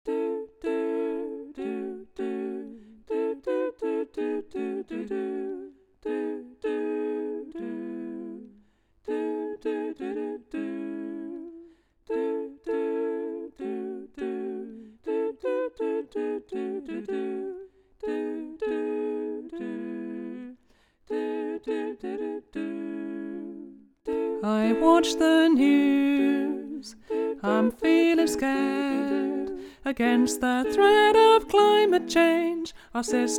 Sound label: alto bass